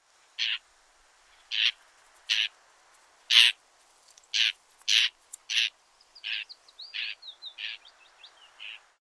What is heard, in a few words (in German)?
Wiedehopf Alarmruf